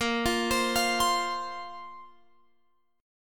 A sharp Suspended 2nd down Guitar Open F; A#sus2 arpeggiated

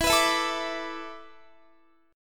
E 7th Suspended 4th, Guitar; E7sus4; strummed; x,19,21,21,21,21

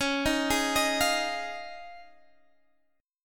F Minor 7th Sharp 5th C sharp

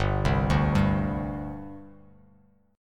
G Suspended 4th; Gsus4 arpeggiated; JGuitar

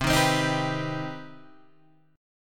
C Minor Major 7th C Guitar Open G

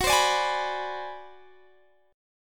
Ab 7th Sharp 9th Flat 5th Gb, chord, guitar

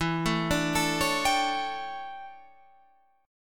D Major 7th Suspended 2nd Suspended 4th

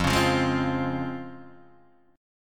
C sharp Major 7th, F, guitar chord

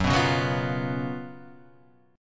F sharp Major 7th Suspended 4th

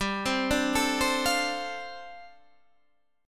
G 9th Suspended 4th, Guitar, Standard; G9sus4 arpeggiated

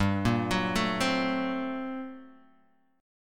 G Minor Major 11th, G, Guitar, Half Step Down

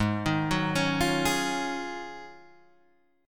Ab 13th Suspended 4th Ab; arpeggiated; JGuitar

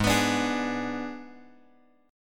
G sharp Diminished; G#dim Chord {4 X X 4 3 4} strummed

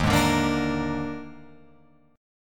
Eb Major 7th Suspended 4th Eb, Guitar, Open F; EbM7sus4 strummed

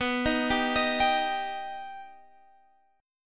Eb Augmented B

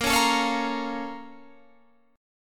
Bb Minor Major 7th, Guitar, Open G

